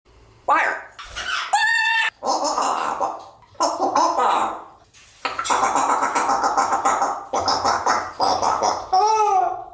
fire waaaa

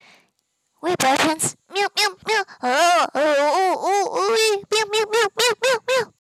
meow

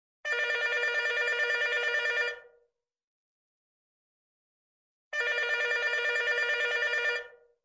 ring telefon

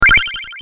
gem ding

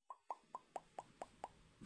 meme cat mouth

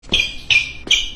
basketball game

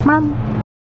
frog on mic

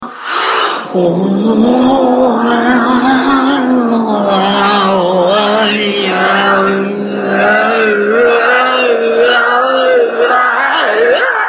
blast

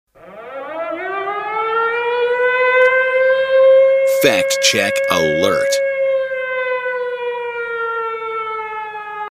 fact check alert

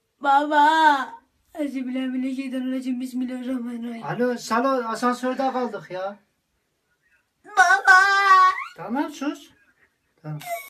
babaaaa

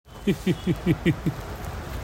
lough

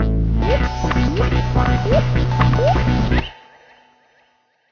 splash screen; didj

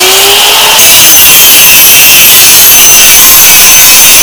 angle grinder earrape

